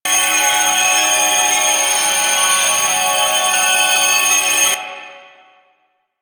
Veil Struggle Loop; Warframe; The New War; Stolen Plates

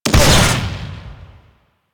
projectile explosion; Enemies; Warframe; Vomvalyst; Teralyst; DE

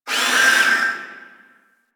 Gas Robot Repair Arm; Ambience; Props; Warframe; Corpus Gas City

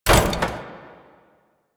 Metal Ladder Hit

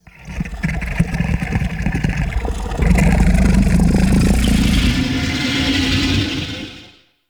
Pit Monster Inhale, Infested; Sleep; Warframe

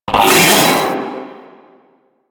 Vocal Pain; Warframe; The New War; Archon; Nira

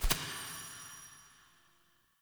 UI Default Map Marker Select

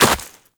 Run Snow, Footsteps; Warframe; Operator

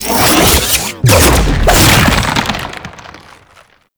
Treasure Box Clone Drop; Warframe; Man In The Wall

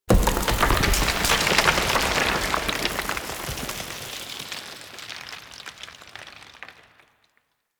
Falling Rocks Stinger; Warframe; Ambience; Venus Open World; Objective Rooms; Venus Obj Deck Twelve

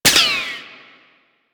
Sniper Ricochet; DE; Warframe; Frumentarius; Exalted Sniper

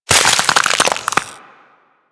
Ice Freeze Hit Effect; Warframe

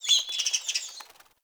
Fey Flock Attack Dive

